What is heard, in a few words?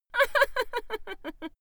female laugh